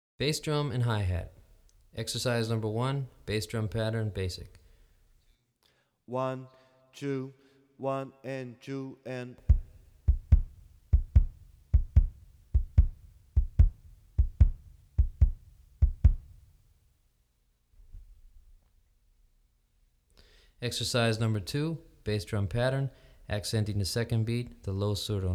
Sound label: Bass Drum Hi-Hat; Brazilian Rhythm For Drumset; DaFonseca, Weiner